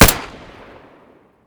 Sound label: muzzle glock17 stereo